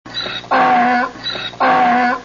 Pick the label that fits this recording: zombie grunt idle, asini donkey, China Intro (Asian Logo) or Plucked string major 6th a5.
asini donkey